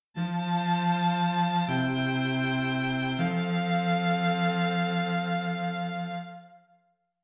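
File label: string quartet, dictation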